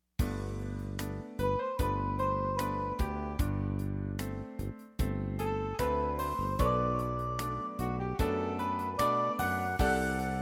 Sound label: Sample Improv Idea